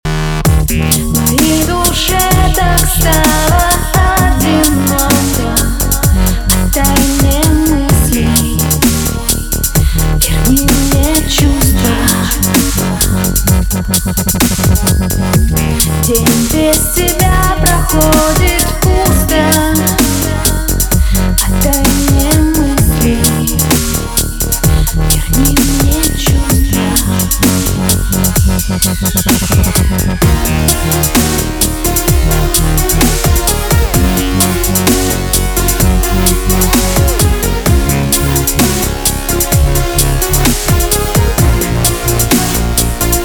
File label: dubstep version